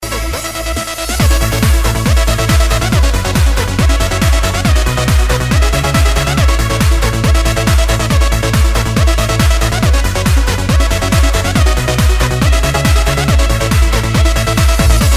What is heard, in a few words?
techno; matrix